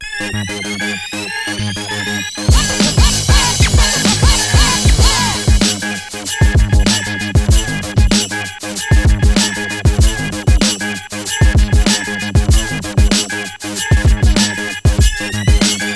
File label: Power Groove; NBA Live 2003; PlayStation 2